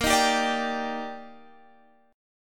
Eb Major 7th Bb

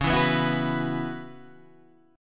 Db Minor 11th Db Guitar Half Step Down; strummed

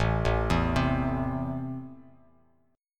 A Minor, Bass Standard; 5,0,2,5; JGuitar

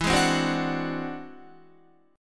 E Augmented 9th E down Guitar; E+9 strummed; JGuitar

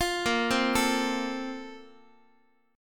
Bb Major 7th Suspended 2nd, Guitar; 4A,4E,5A,5D,5G#,6C#; arpeggiated

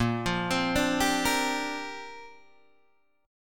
Bb 6th Add 9th Bb Guitar Open D

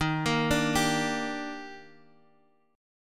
Eb Major 7th, Guitar, Half Step Down; EbM7

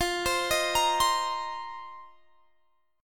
F 7th Suspended 4th F down; arpeggiated

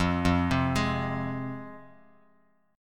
F Minor F down Bass Standard; Fm arpeggiated

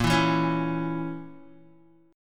Bb Diminished Bb Guitar; strummed; JGuitar

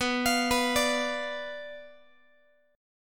B Diminished B Guitar; Bdim arpeggiated; JGuitar

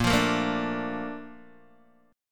Bb Major 7th, Bb, down, Guitar, DADGAD

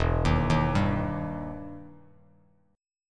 E Minor Major 7th E down Bass Standard; EmM7 arpeggiated

